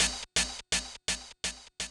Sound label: DRUM SHOT HOUSE 125; eJay; Singleshots